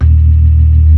ORGAN, C3 NO PERC; Keith Emerson Signature Series

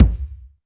Kick, Alesis DM5

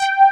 RESO ANA BS, BASSES; Pro-Rec - Synthsonic

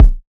kick A, hip-hop drum kit; 99Sounds x Monosounds; Moon

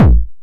909 BD Tape Sat

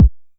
909 BD; Goldbaby Tape808 & Tape909; The Tape 909

